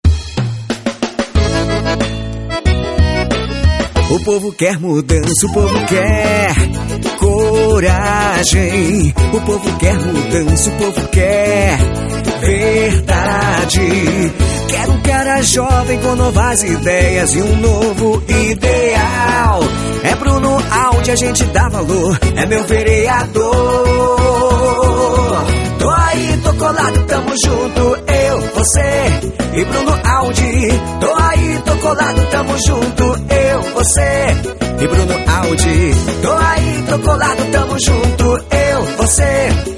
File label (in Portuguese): Jingle politico